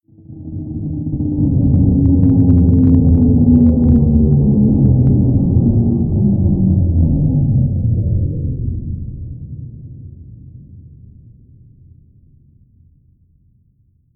Power Down

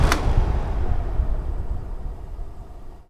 Generator Off

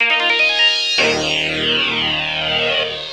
System start

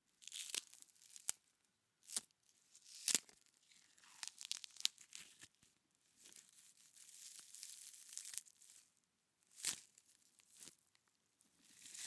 Frozen Celery Breaking Cracking Bones

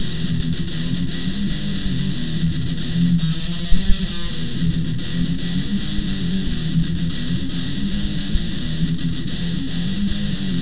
heavy guitar riff

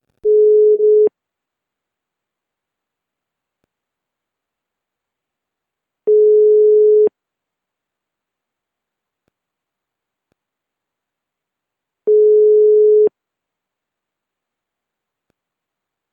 Phone sound

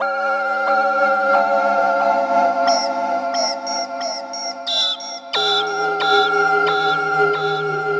Horror Vocal Sample 90 Bpm

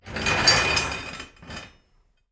Metal Piece Heavy Drag On Floor

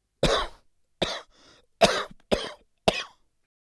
Cough

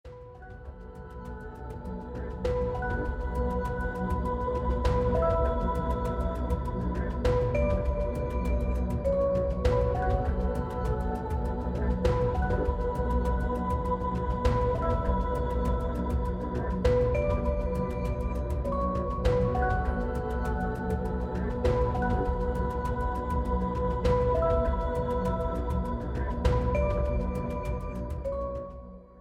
Intense Music For Suspenseful Scenes

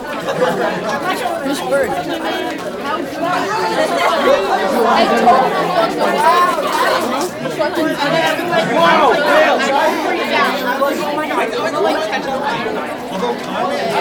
Hallway

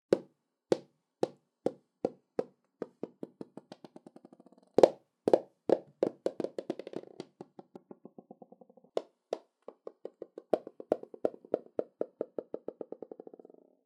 Bouncing Balls Variation

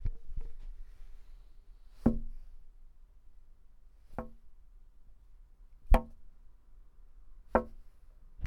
Glass Hit

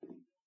Footstep On Wood

